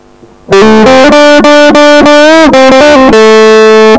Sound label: quick lick; guitar